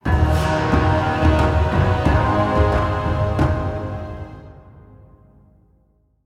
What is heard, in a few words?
Legendary Stinger; Capn Rokara